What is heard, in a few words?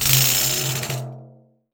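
LARGE PANDE, PANDEIRO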